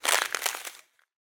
nature thin ice crack under pressure

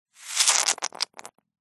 foley liquid squirt foamy dribble weak short

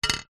glass pane small tap on metal; foley

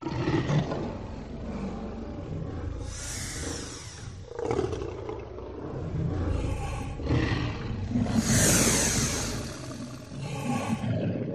Dinosaur Growl; audio hero

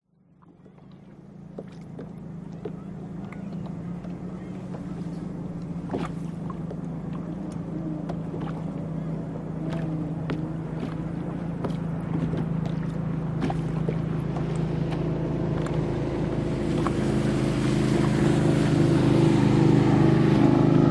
boat motor passing lake recorded from kayak; vehicles

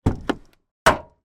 van door broken panel open internal; transportation; sound effects; sound effects one

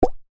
bubble pop

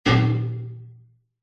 impacts metal medium hit clang small reverb; zapsplat

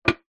container lid plastic thick set down wood

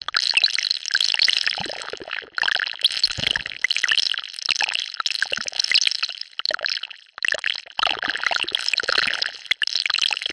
science fiction virus mutating weird; audio mangler

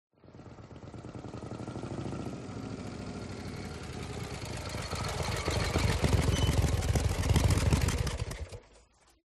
MOTORCYCLE Harley Drive Arrive Shut Off; TRANSPORTATION

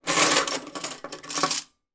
household cutlery handful pick up from table

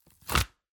Book Rip Cardboard Cover

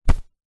clothing thick jacket punch sweetener; zapsplat

